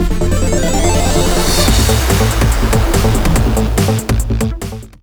Trailer Jingle; Ghost Blade HD Altered State

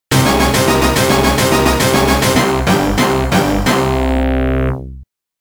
PATTERN CLEAR; Contra Chronicle Vol.2 The Beginning of the Legends

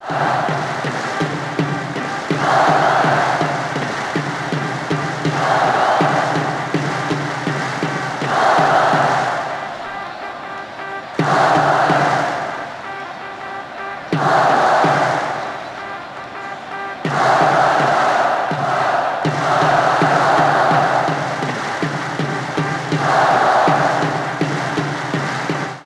Crowd Half time loop; soccer slammers switch gamerip 2018

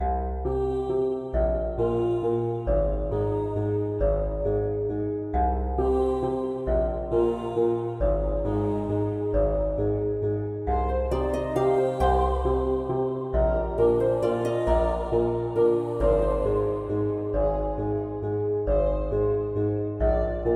Fox Flashback

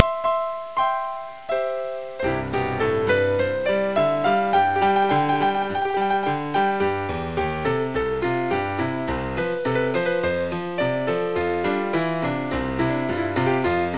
Saloon Ragtime

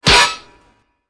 Skill Hit Melee Blow; Angry Birds Epic

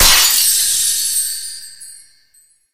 Vial Break; angry birds

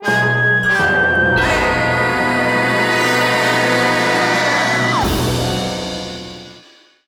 Goal Fanfare; crash bandicoot series sound box; 4th8th Place

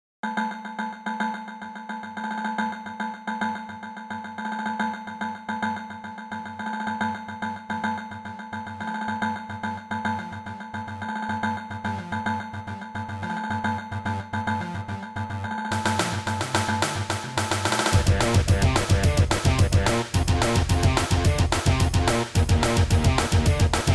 music upbeat; galaxy hero android

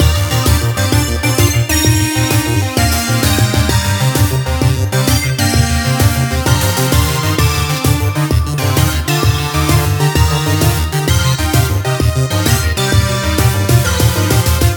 Victory loop; deity quest

